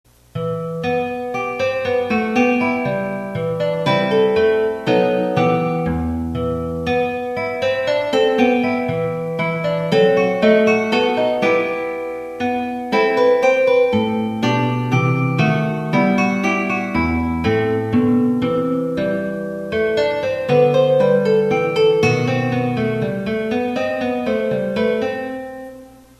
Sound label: String Quartet For Guitar